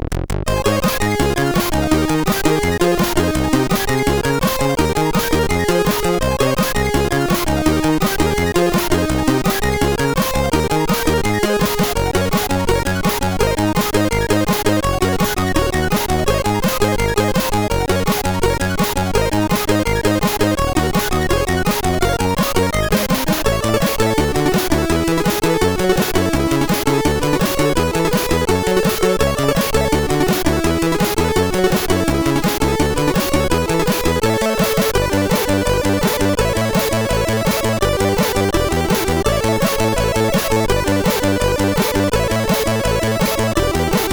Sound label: Hammastahna, MOS6581R3; sid